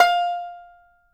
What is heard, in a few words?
banjo F5, banjo notes, country; Sampleheads; Whole Lotta Country 1 & 2